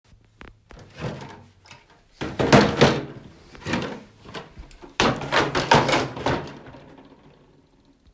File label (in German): Kisten stapeln